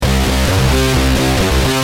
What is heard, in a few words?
G Pump, Guitar; Industrial